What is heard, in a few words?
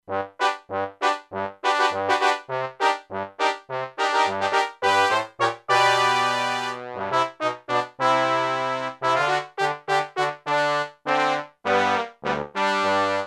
Bone & Trumpet, E-mu Proteus-2; Factory Presets